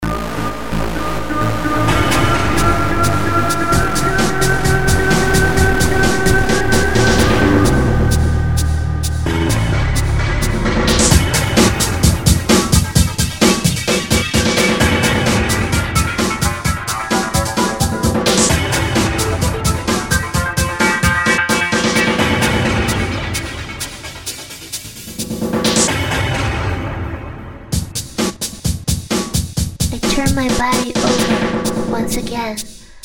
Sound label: Electrical Waves; Vidian